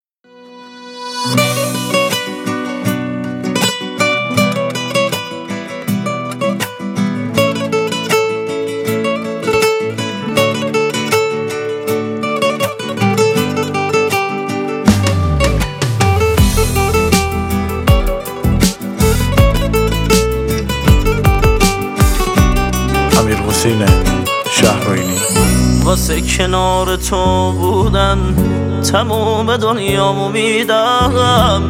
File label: Basse